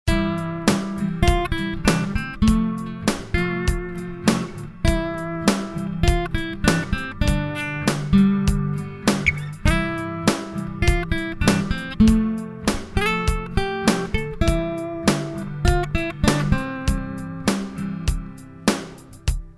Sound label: reverb no